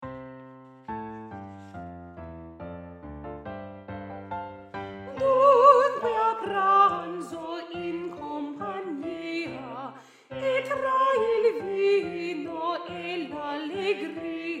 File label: Soprano